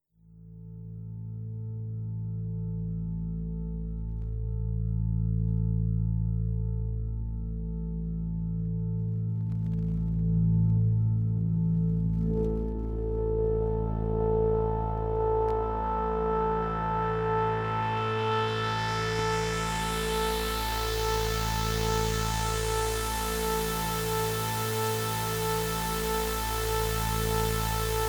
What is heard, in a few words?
A drone